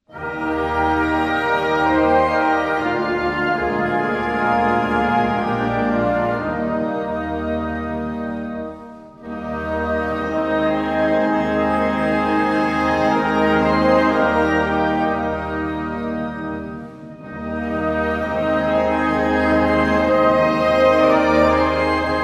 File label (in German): Hymne Tschechische Republik